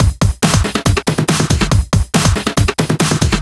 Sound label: Break Beats, 140 BPM